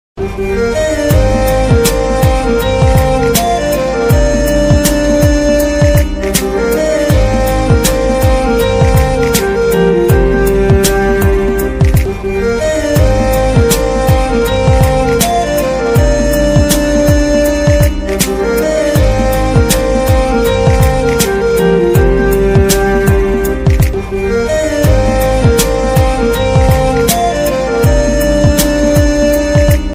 Mobile Ringtone Instrumental Ringtone Sad Ringtone Romantic Ringtone